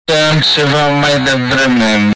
piangere; Piangere, ah, mai dovremo

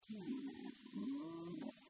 piangere

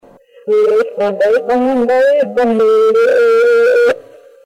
canto; canto incomprensibile

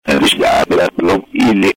risate

